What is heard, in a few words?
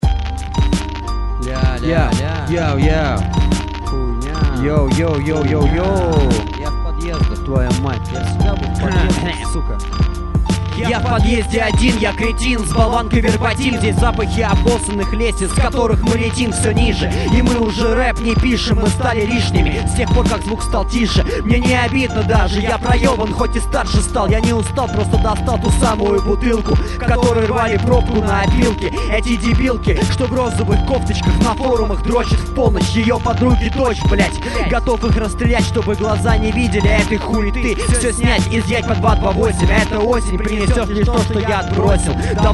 techno